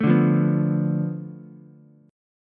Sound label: B Minor 7th Flat 5th B Ukulele; Bm7b5; strummed; JGuitar